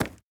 stag footstep wood hard; Rust

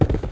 Horse Run; Civ4 warlords

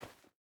footstep boots dirt jump start; Rust

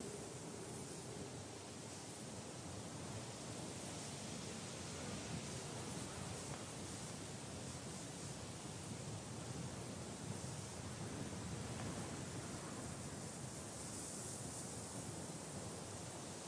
sand, ambient savanna; World of Tanks Blitz

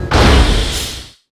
tutorial door end

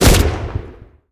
mg 13mm dshk lastshot, guns tanks; War Thunder

